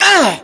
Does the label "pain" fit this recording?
Yes